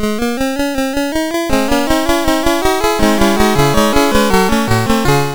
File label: MOS6581R2, sid; Rupert and the Ice Castle